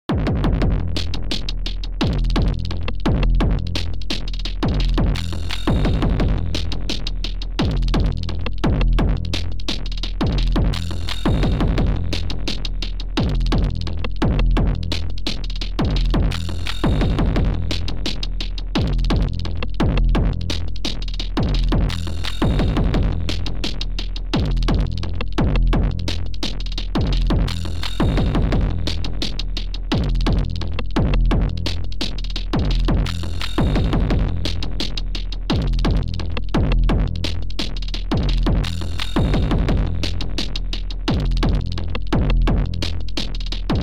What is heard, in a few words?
Dirty Delay Very Dirty; Metric Halo v4; Drumazone2